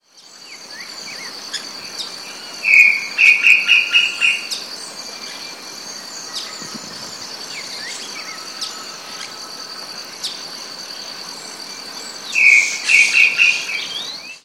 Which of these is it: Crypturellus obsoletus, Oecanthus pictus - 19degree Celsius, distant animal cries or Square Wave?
Crypturellus obsoletus